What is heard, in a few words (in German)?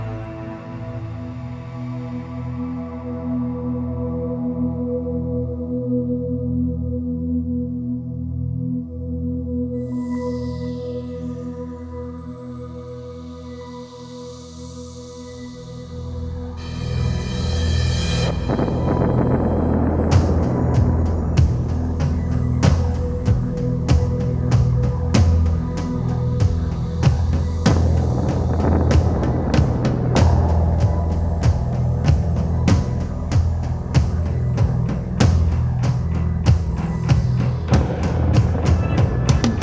Trommeln Der Nacht